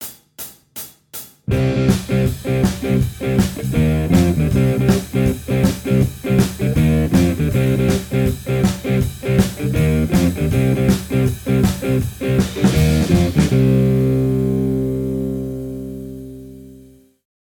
Slide, Guitar; Guitar Techniques 2019 Issue 290 January

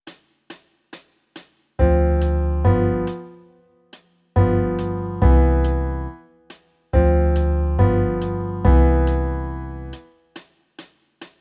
Jazz backing; Guitar Techniques 2019 Issue 294 Spring

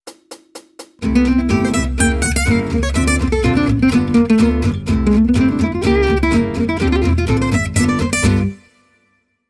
Jazz, Guitar

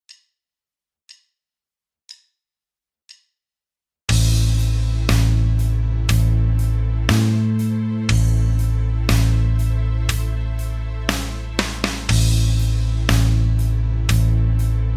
In The Woodshed backing, Guitar Techniques; Guitar Techniques 2017 Issue 275 November

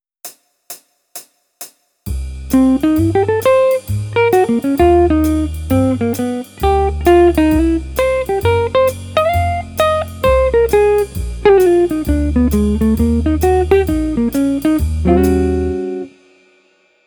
Jazz, Guitar; Guitar Techniques 2018 Issue 289 December

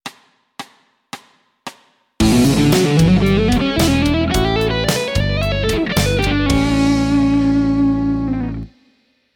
One Minute Lick slow; Guitar Techniques 2019 Issue 294 Spring

